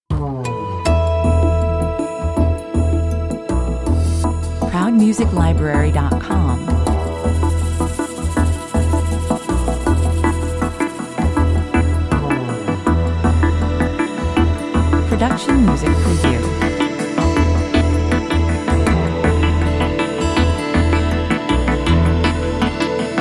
80 bpm, romantic, elegant

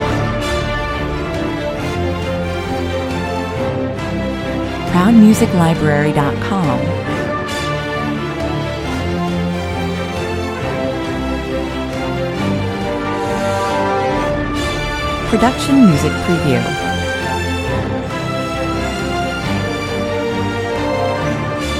no choir no percussion

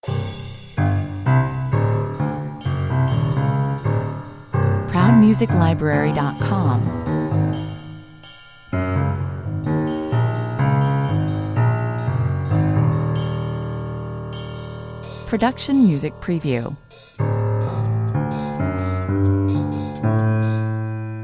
piano atmos

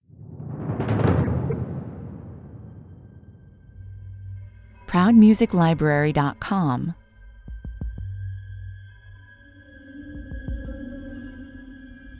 deep sleep heartbeat

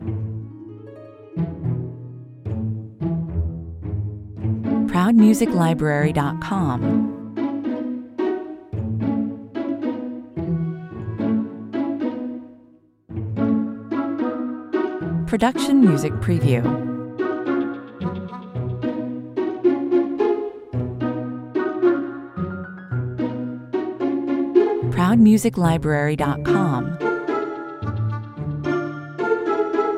no drums perc

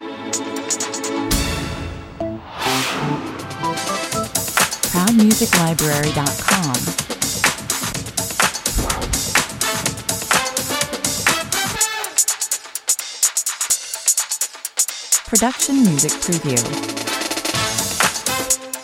basic drums 125 bpm

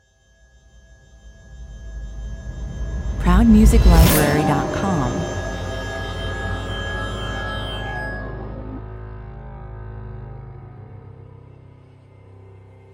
dark future opener rev suction 120 bpm

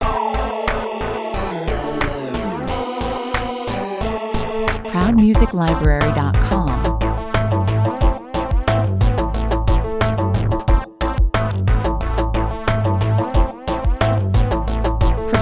flex hip

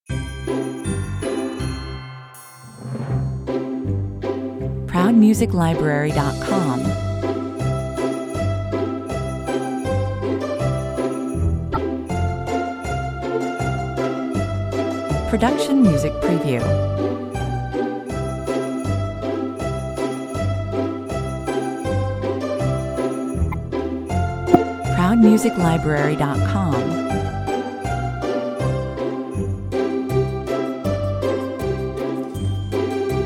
jingle bells (no drum kit)

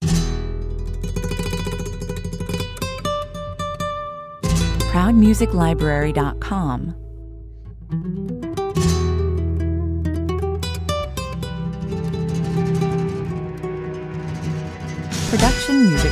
tango, Passionate, Sultry, Steamy

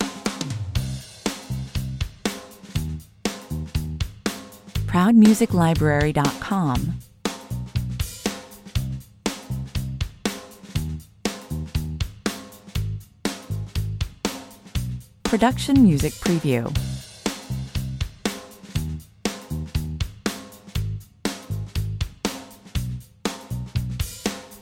bling it on (bass drums)

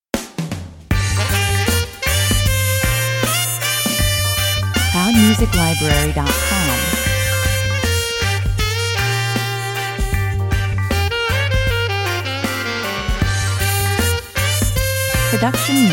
a reggae moment 78 bpm

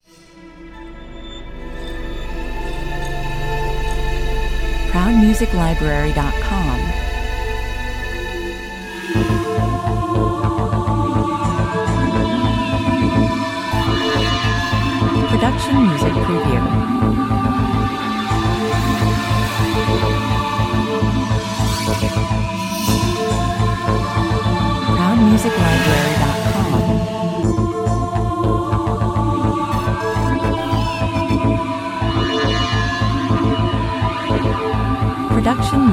weird technology 105 bpm

